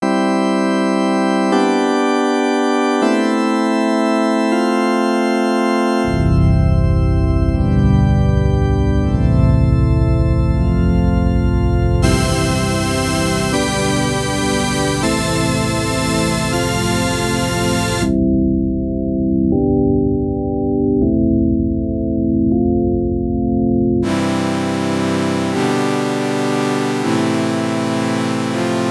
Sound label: Organs